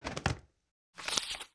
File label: card flop